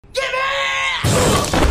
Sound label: fall; gibby